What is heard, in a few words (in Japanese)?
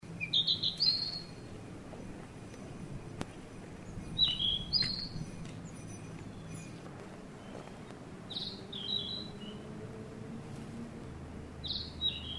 キビタキ, narcissus flycatcher